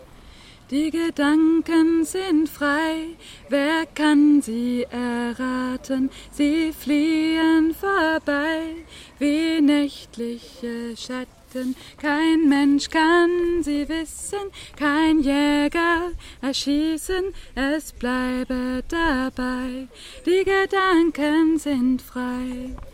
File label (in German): die gedanken sind frei alti